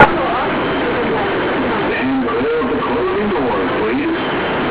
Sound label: closing doors